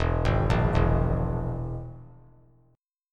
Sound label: E Major Flat 5th; arpeggiated; JGuitar